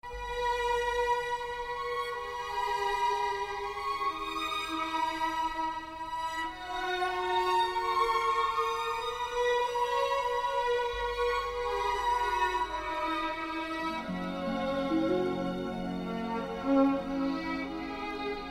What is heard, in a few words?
Love Melody